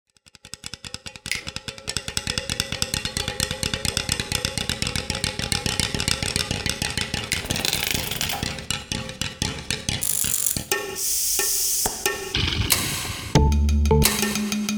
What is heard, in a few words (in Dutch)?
fietsbeats